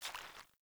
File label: Grunt Boot Walk Water; Characters; Enemy; Melee Grunt; Footsteps; Boots; Sinecure